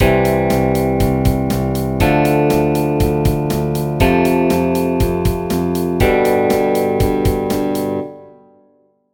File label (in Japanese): ドレミファソラシドバンド ベース入り